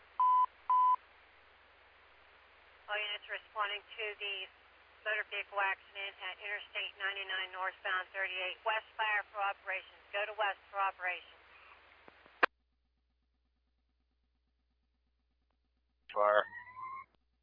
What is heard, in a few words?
alert tones page received